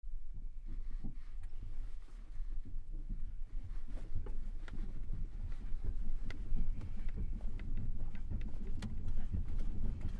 Car Tire Flat; Start, Slow To Fast Roll No Motor, Interior Perspective